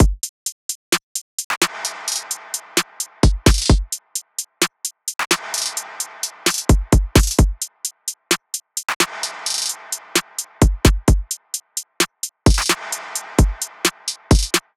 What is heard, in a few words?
beat loop full 130